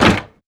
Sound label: Hinged Door Open Strong